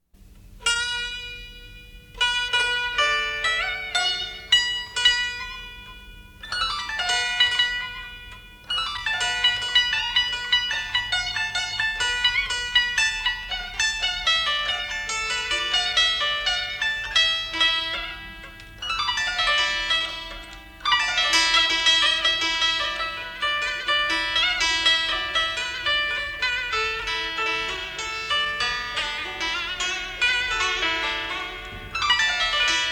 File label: Classical Quintet Ngu Tuyet Zither Tranh; Vietnam Music